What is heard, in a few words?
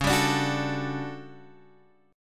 A 13th Db, guitar chord